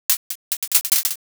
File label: firecracker